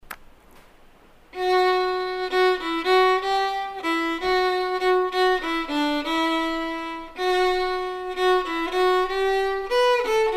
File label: Jig, fiddle; Foxhunter's Jig